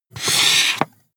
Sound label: marker mark hard